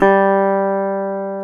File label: GTR, Dobros, National